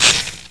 spark; XDM; buttons

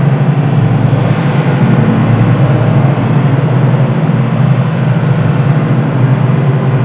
generator work; hl1mp; minophor

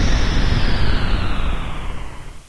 power down; hl1mp; XDM; buttons

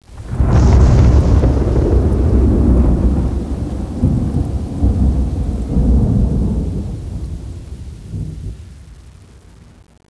fire thunder; XDM; ambience

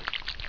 flesh, debris; XDM

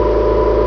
generator; XDM; ambience

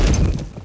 concrete, debris; XDM

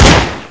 gun, weapons; XDM

